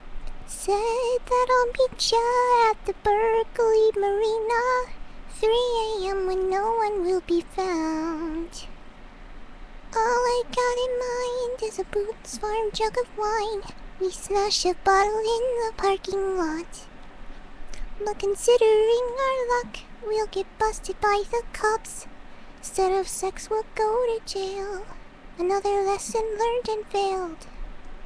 Singing; Fluttershy

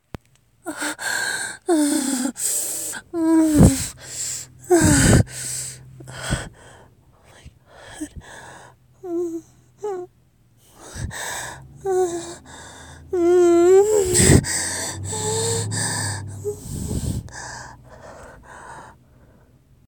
Climaxing

